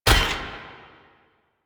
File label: Melee Step; Warframe; Necramech